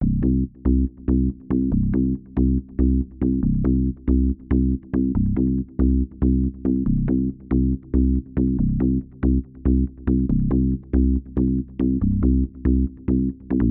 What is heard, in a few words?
Basis bassloop